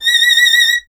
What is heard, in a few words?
STR VLN, Vln Detache; Miroslav Vitous